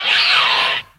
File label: Duskbat Death